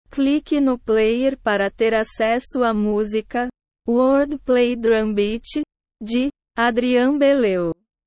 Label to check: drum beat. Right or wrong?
Right